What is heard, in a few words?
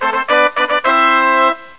message